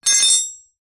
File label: foley metal rod down on ground